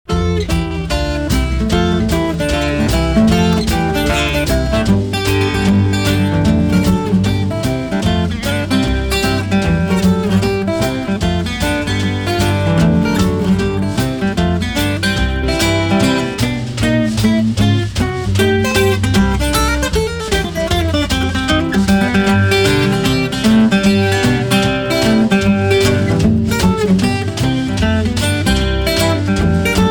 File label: Finger Buster Blues